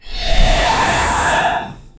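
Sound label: ritualistic chant beginning, cultist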